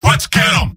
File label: m battlecry; tf2; garrysmod; mvm